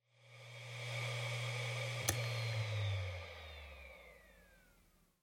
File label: Hard Disk Drive Forced Shutdown